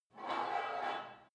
take off weight barbell reverby quick, fitnessstudio